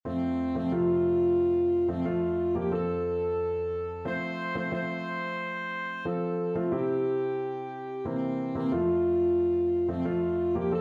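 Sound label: alto saxophone; joyful sound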